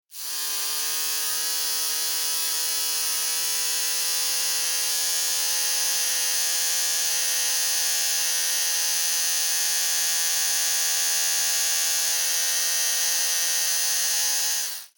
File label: Barber razor haircut electric hair clipper